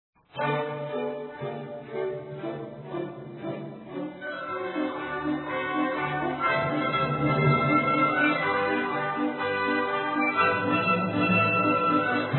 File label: Polka, spring concert; Strauss; Thunder and Lightning Polka